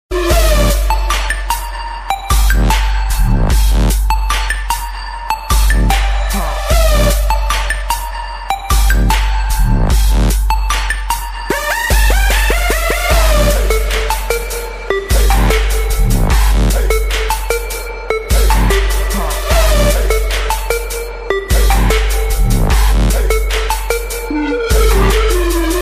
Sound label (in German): Handy Klingelton